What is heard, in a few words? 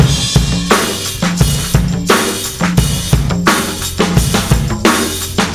Monsterfoot w crsh and hat, Drums Beats